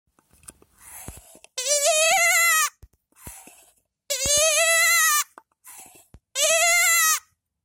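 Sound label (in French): Boîte à mouette Cri de Mouette; Boite à meuh Cri de Mouette; boite à meuh musicale, en version mouette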